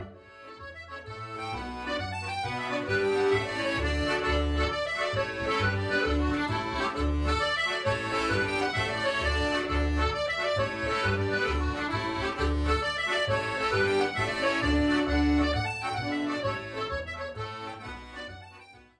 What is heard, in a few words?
Irish Polly